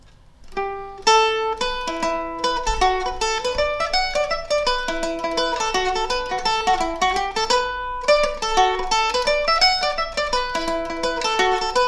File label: green fields of rossbeigh, mandolin; Eastman MD304 mandolin